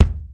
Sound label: kick